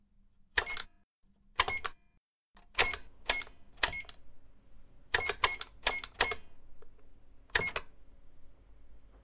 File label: Button Sounds With Clicking Sound; Star Wars Like